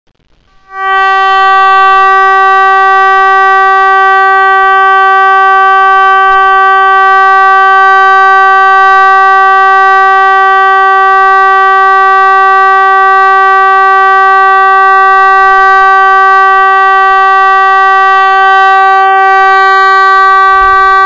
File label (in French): Signal national fin d'alerte